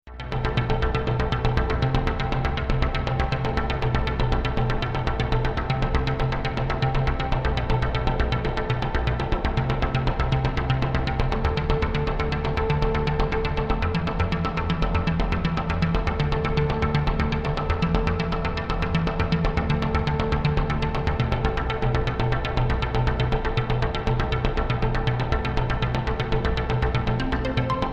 Drone Scifi Musical Atonal Arpeggio Tension Builder; Musical Sound Design